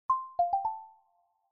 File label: Knock, notification; Bluboo; S8